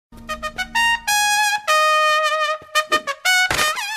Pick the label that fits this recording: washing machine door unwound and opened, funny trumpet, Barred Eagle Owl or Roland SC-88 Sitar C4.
funny trumpet